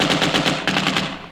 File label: GUN